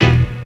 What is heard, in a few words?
tuners